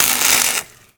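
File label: electric spark welding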